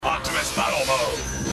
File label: robo; Optimus; Robots In Disguise television show